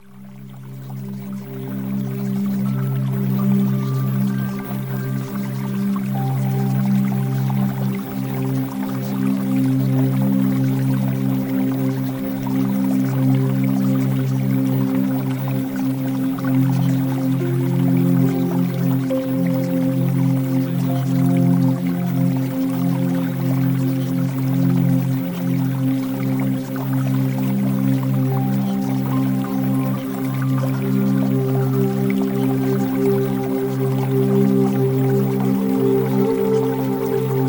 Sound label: Music; Premium Subliminals